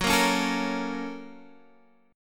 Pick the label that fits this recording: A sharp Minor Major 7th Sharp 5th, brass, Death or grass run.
A sharp Minor Major 7th Sharp 5th